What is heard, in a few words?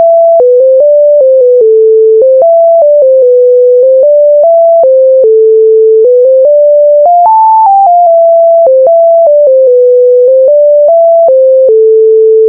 tetris raw sine; Python